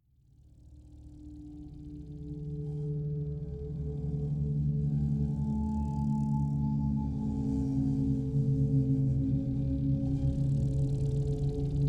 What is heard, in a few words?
Savannah Music